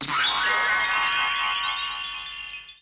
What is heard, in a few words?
magic